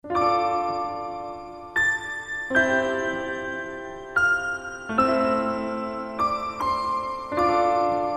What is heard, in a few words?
slow music